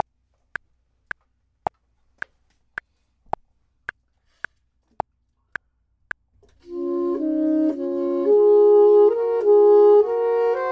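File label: both, Saxophone and Clarinet; voxman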